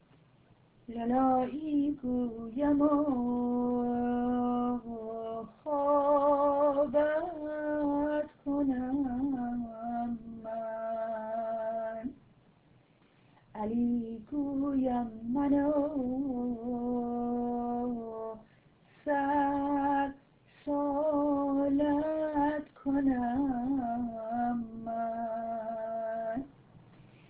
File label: Persian Lullaby